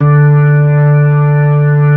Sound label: B-3